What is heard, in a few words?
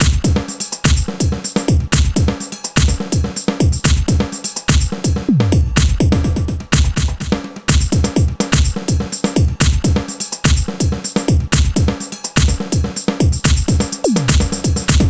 Beat, Drums, Eclectic Electronica